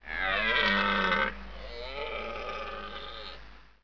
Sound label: red deer; CRC2005; CRC 2005 Demo